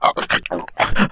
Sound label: choke; PC Gamer IT CD 41; Daikatana